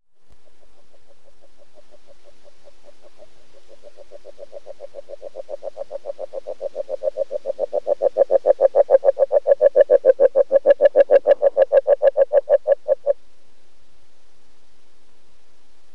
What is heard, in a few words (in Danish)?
springfrø